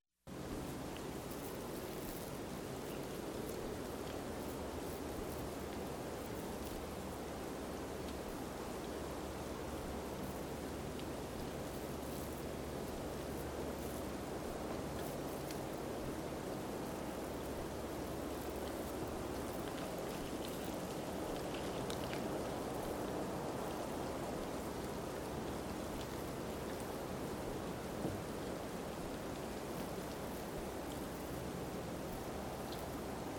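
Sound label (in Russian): снегопад с ветром 51 сек собака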